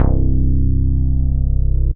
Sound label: Mini Bass; Super Boss; Ableton; Factory Packs; Chop and Swing